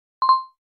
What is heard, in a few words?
video pause